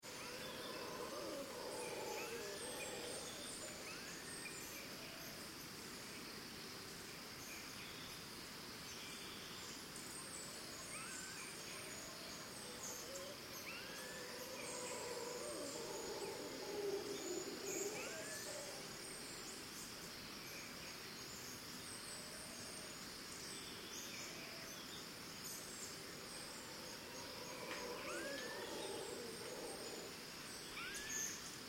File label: Birds Crickets Costa Rica Distant Monkeys; Backgrounds; Forests And Jungles